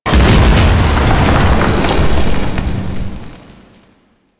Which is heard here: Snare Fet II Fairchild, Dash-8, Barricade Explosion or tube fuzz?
Barricade Explosion